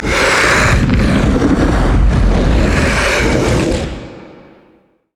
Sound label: spider idle; LoL